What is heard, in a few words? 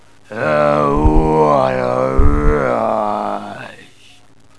hawk